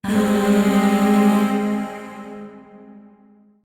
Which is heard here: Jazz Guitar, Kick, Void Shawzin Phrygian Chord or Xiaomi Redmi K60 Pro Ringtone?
Void Shawzin Phrygian Chord